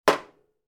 household plastic cup down on metal draining board